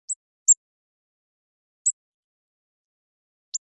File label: Cyanistes caeruleus; SETE